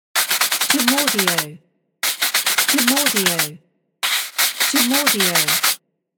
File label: Intense Brushing Cleaning